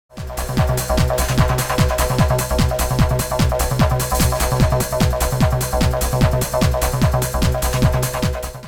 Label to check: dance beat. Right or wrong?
Right